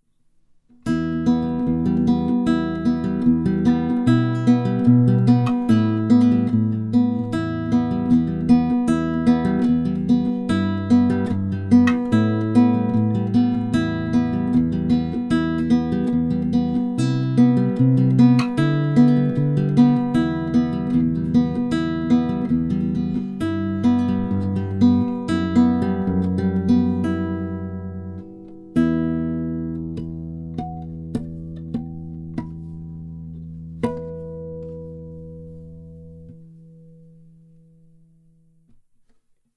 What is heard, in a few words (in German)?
Nylon Ibanez Lewitt Niere